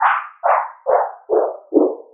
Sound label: Space Bird